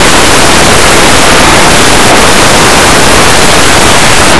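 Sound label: envelope; Blue Heat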